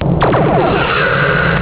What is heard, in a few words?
weapon